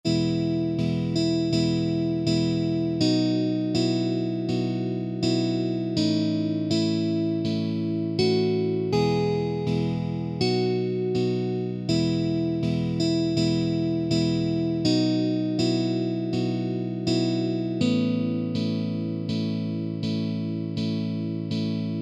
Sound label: Verse Slow Tempo, guitar